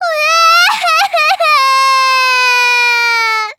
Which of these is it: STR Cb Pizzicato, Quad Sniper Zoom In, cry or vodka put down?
cry